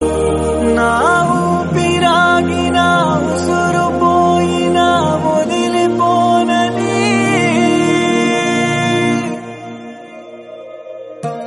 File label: Telugu song